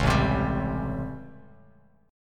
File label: A 7th Sharp 9th, Bass Standard; A7#9; strummed; JGuitar